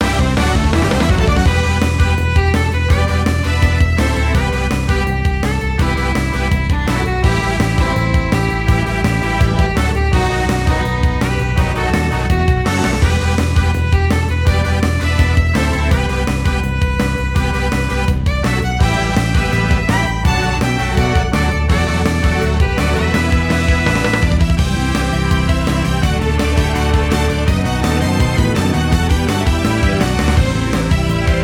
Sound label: Battle; cross hearts arcadia; android